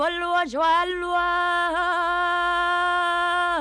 VOC

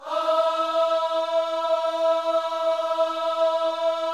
OHS E 4, gospel; Spectrasonics; Vocal Planet Vol.1 Gospel; akai

